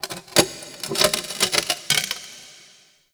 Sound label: Terrarium Glass Stress; Warframe; Ambience; Entrati